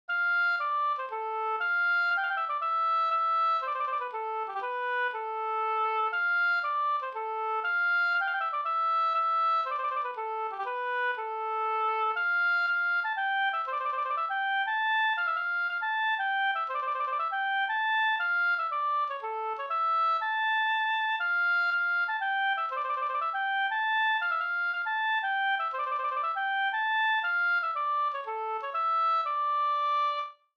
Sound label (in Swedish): polska Bodapolska